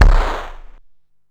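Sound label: MISC Right, Techno 138 BPM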